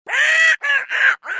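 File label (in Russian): смех попугая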